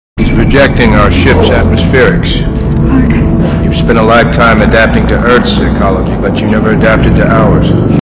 ship atmos

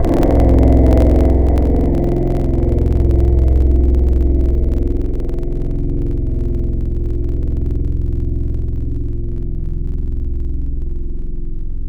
Saz; Synthetic's Serum Collection; Echo Sound Works Sphere

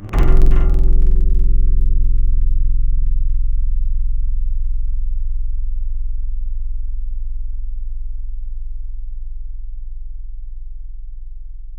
Acoustic Low; Synthetic's Serum Collection; Surge Sounds - The Sky - Serum Wavetables

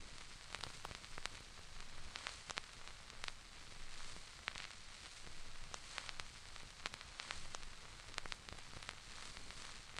Vinyl FX; HYPERBITS

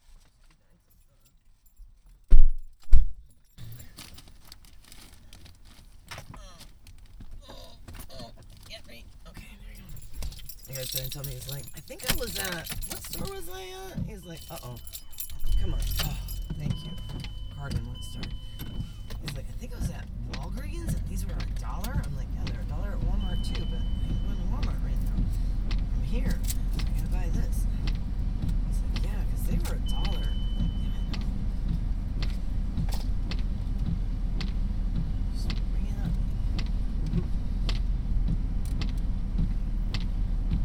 Mom Getting In Car, Talking, Wind Sheild Wipers; Car Heater

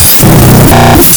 Ice Bell; lolicore

